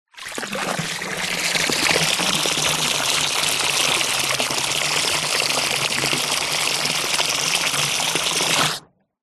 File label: household water run from hose into swimming pool close up short